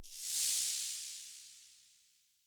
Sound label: Ship Descent Elec FX; Ships